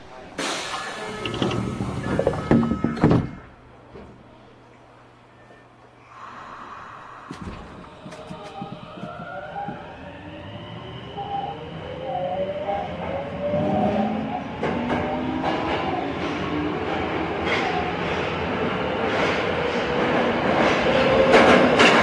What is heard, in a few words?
vvvf, mitsubishi; eidan